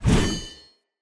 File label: Cleansed Spear Heavy Whoosh; Weapons; Player